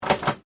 Lock Robotic Click, Scifi, Lock, Robotic, Click, Science, Fiction; Robots